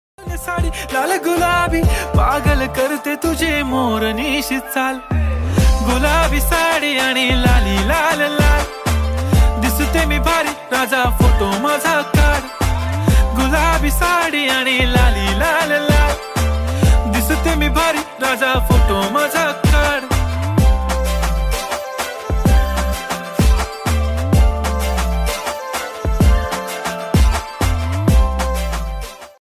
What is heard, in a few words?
slowed reverb ringtone pahadi song